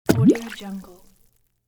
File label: throw stone into the water